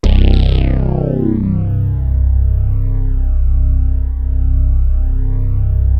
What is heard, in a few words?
SYN LEAD; MORPHEUS